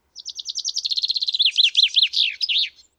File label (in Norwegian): bokfink